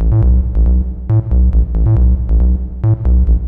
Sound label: PROGRESS, DOUBLE BASSL, Techno 138 BPM; Best Service ProSamples vol.54; AKAI